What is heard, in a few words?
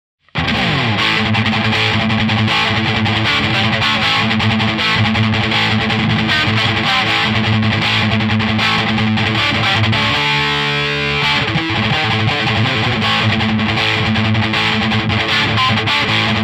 JCA-20 Maximum Gain